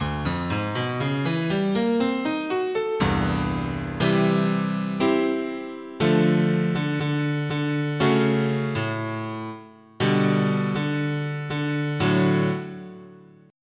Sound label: Piano; EverQuest